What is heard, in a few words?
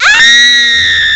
SCREAM, HUMANS; M8; CMI Factory